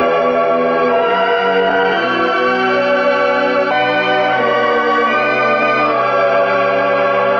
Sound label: strings, Sad